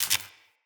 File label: Shield Orb Zap